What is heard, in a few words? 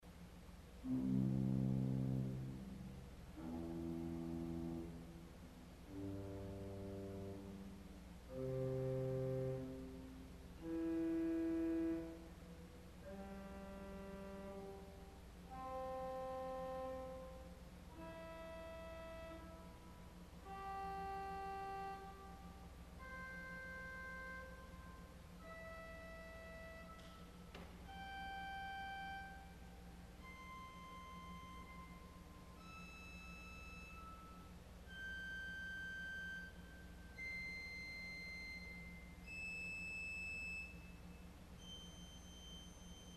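Salicional Arpeggio, organ stop; CulverAcademy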